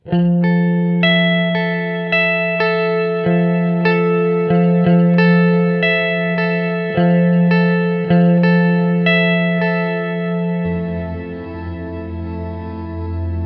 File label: Mood Mk2 Reverb Tape